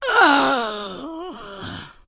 die, elven female

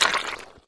foot on mud

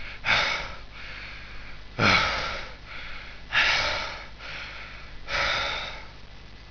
breathe fast

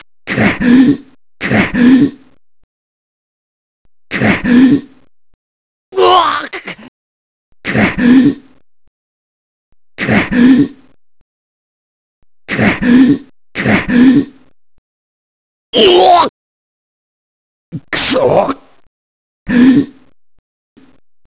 nail

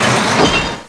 walk

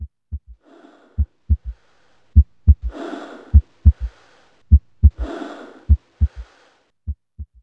heartbeat

